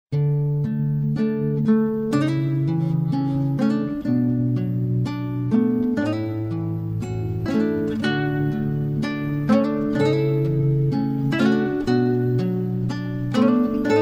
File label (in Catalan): musica